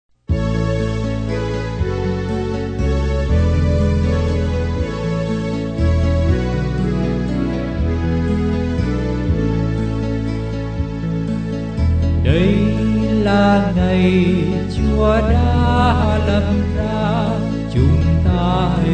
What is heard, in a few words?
BASS